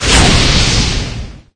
Transform Flight; Fire Spirit; cookie run

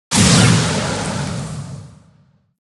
magic candy bow shot; Blue slush cookie

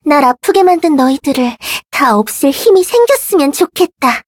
levelup; cookie run

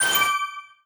jump; Cookie Run

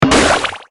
Lime Bounce

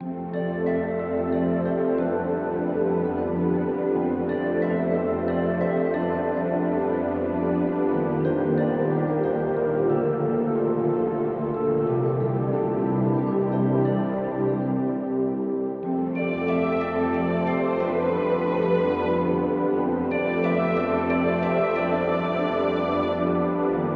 BGM Moonlit Underwater Kingdom; cookie run

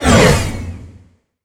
red velvet sword shot; Cookie Run

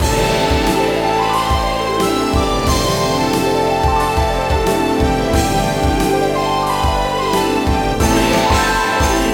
Bgm skill; spring onion; Cookie Run

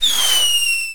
Rescue Blast Start

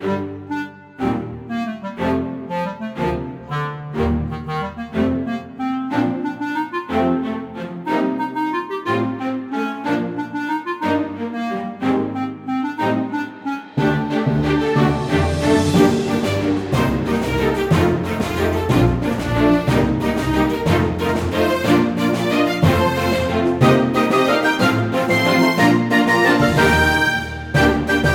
BGM; cookierun

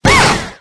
Power Spike; Lime

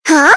Slide; cookie run

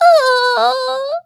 hit; cookie run

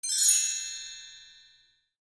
move box

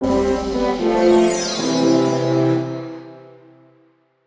UI PVP Level Down

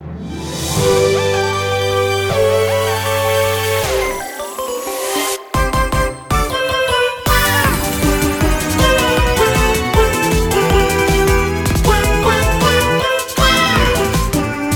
Bgm trial; heartsping

